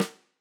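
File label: Tam Snare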